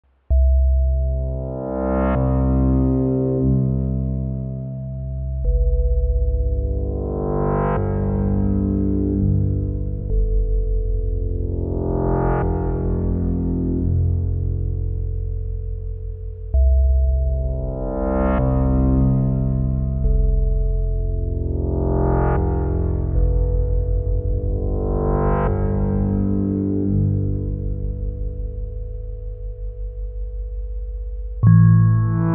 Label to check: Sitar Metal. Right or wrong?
Right